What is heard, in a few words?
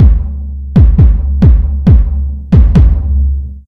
music game; EarthAttack